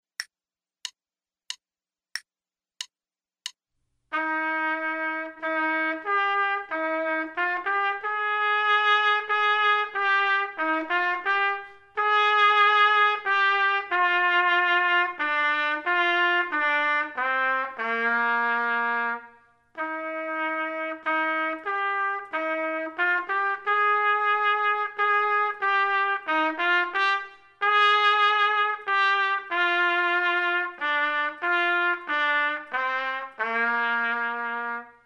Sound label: trumpet 2, Andante; metronome setting for this performance is quarter note = 100; Selected Duets for Trumpet, Page 20 Number 17, Andante, performed by David Summer.; Trumpet 2 Only